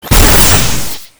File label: tank railgun fire; Quake 4 Alpha; id software